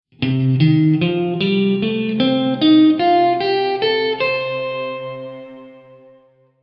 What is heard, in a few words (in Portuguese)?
Escala Pentatonica de Cm6